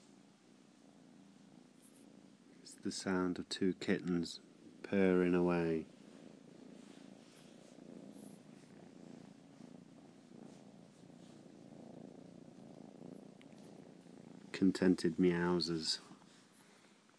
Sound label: purring kittens